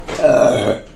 burp; nani heichou

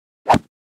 sword swing; minecraft

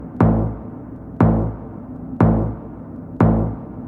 countdown; geoguessr

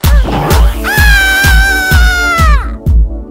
crazy cuckoo scream 2 kids

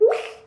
bloop fart

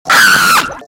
loudest scream

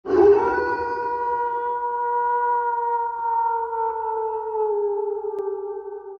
werewolf howl

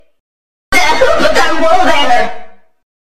wooaahh

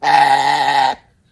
buzz

